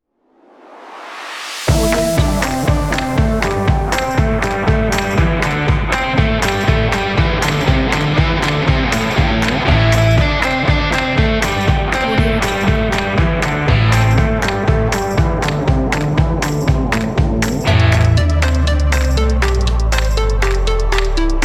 upbeat stomp indie rock logo intro

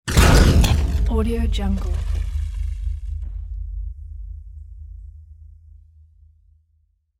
concrete debris falling down

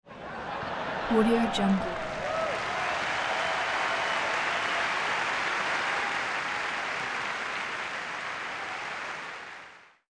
crowd applause; crowd 50k; hollywoodedge

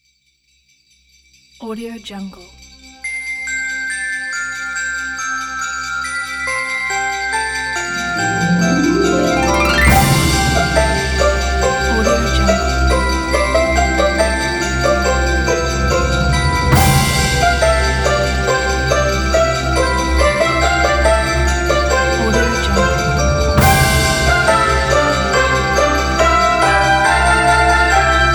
christmas deck the halls intro

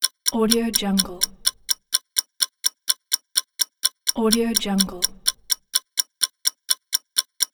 clock ticking

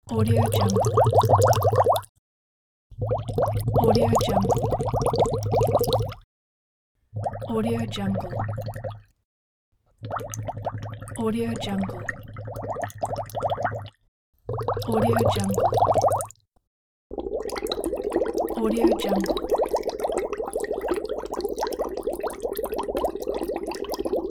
bubbling; desmondsyner

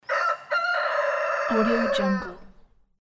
rooster

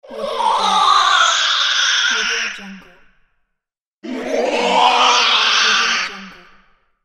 evil spirits

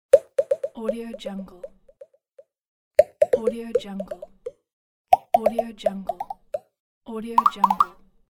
pops loop; volkovsound

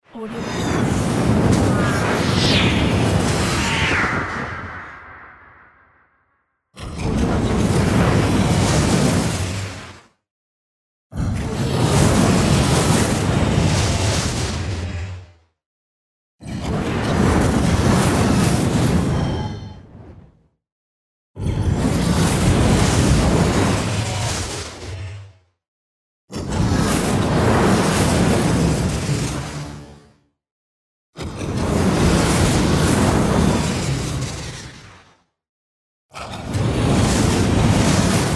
cast whoosh variation

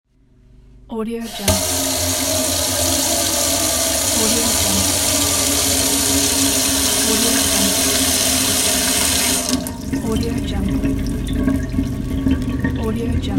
water faucet

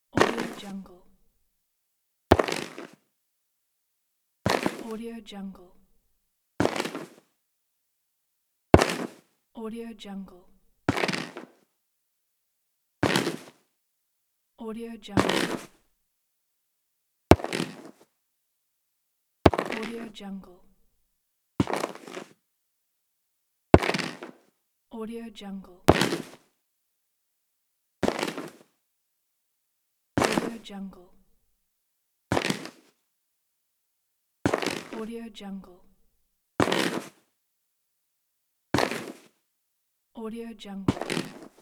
walk gingerly with boots on wooden flooring

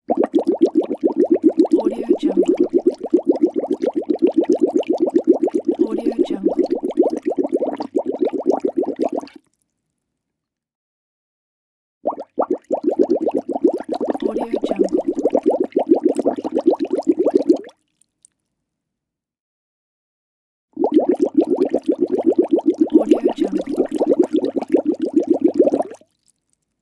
blowing constant bubbles in water; bestsfx

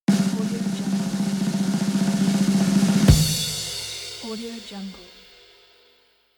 drum roll

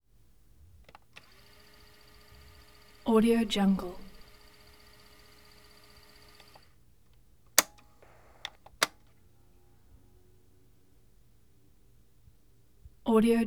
answering machine; prosoundfx

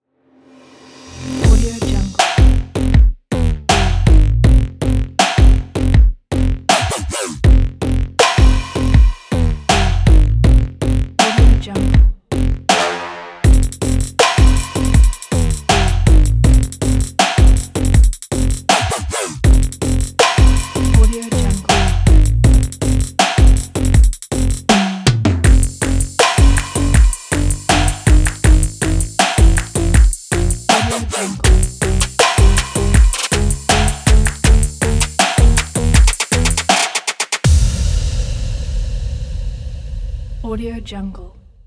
intro trap

